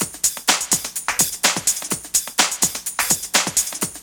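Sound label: drum loop; X-Static Goldmine 3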